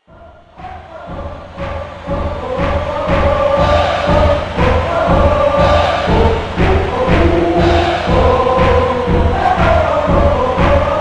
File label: Chants, Toreador Song